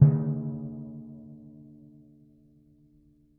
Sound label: D2 p, Timpani, piano; PowerFX; Downtown Orchestra; scales